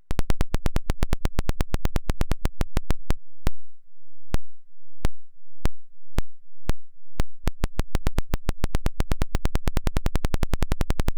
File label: DVCO Ramp X-Mod; macbeth; xseries